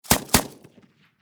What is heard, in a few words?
Warfare Machine Gun 7.62 Caliber Pkm Russian Double Shot Distant Perspective; SFX Bible